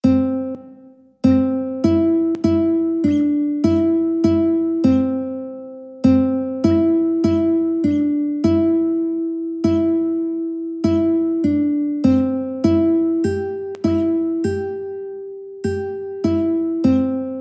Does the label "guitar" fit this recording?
Yes